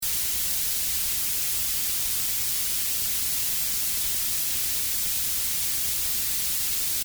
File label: Noise Gen; AudibleDisease